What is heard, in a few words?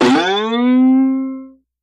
Boing Up; boings